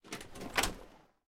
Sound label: Door Open; MiSide